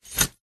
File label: foley tent pegs in small fabric pouch set down or catch; zapsplat